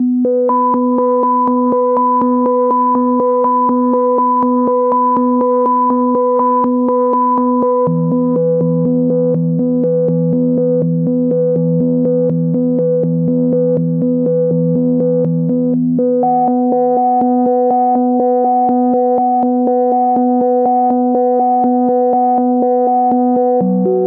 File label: 122 BPM Melodic Arps A maj F min Tonic A; MMB